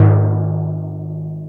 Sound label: TIMPANI